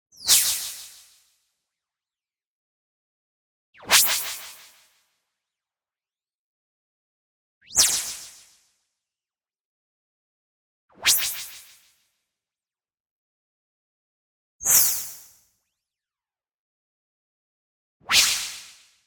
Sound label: movement fast, resonant filter zip; boom library; Anime Essentials; DSGNMisc